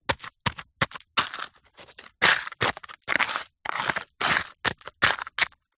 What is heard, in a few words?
industrial fossicking trowel plastic digs scrapes dirt stones dry mud